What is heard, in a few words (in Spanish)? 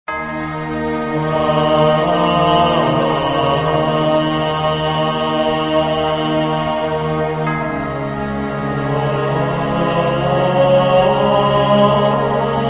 Coro